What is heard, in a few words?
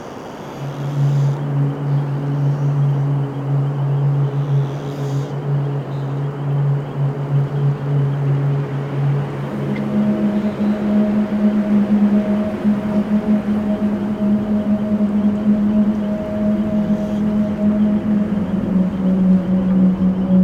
Swamp ambience